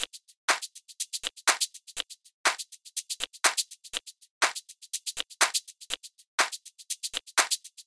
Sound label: 122 BPM Tops; Percussion Essentials 1; MMB